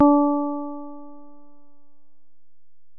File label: Bell, Alesis DM-PRO